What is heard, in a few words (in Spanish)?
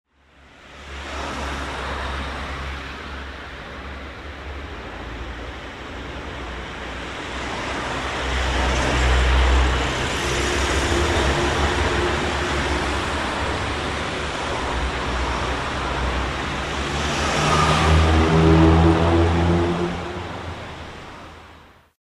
calles paseo rosario; celedonio escalada